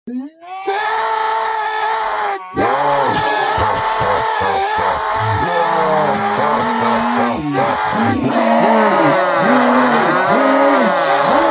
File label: screaming